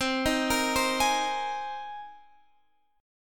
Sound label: C Minor 7th Sharp 5th C; Cm7#5 arpeggiated; x,15,13,15,13,16